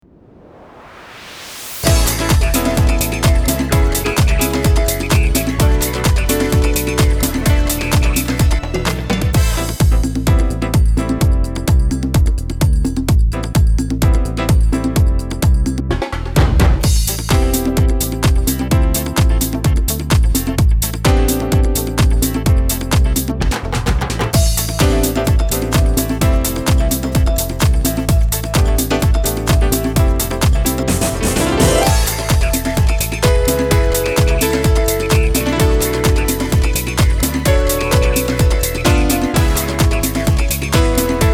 Sambazza piano; Latin Dance

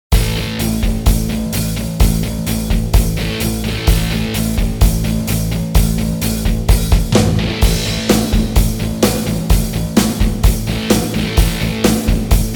POP ROCK; POPeHOUSE

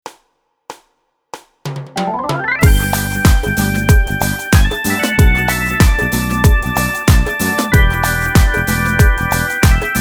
Reggae Dance; Latin Dance

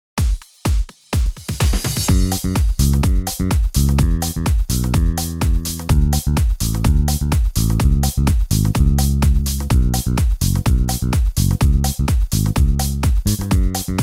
bass

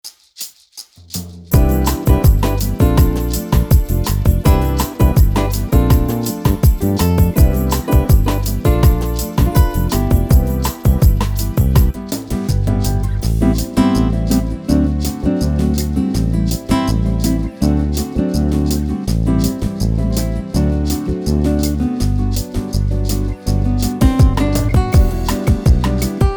Bossa; pop

